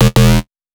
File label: delivery fail; KitchenChaos